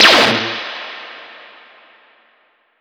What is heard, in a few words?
Fx Impact Sync; bass house; Dropgun Samples